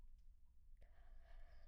Children Choir; Studio Bekol Children Choir

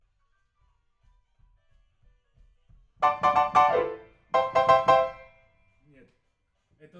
PIANO, RNR for piano

